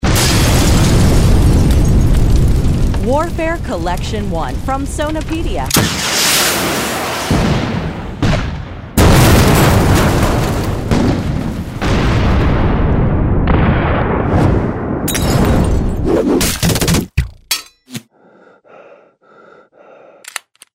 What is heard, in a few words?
warfare sound effects